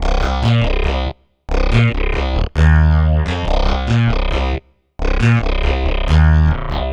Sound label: Rock n Bass C, Synth Bass Loops; Basses; Sonic Foundry (Sony Creative Software); Groove Elements